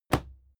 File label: fight impact sweetener punch light; krieg-waffen; nahkampf; soundpool